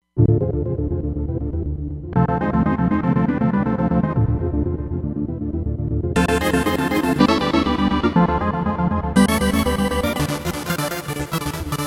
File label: Dancefloor, Roland Fantom XR